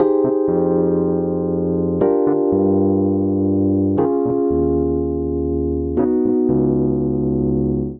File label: Progression Neo Soul F Minor 120 bpm, Electric Keyboards; Ableton; Factory Packs